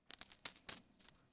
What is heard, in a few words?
coins, SHOP; AMBIENT; Pirates Of The Caribbean